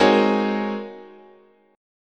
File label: G# 11th, chord, guitar